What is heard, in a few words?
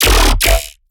bass hit horse; Virtual Riot